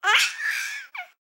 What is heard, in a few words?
baby 5 months laugh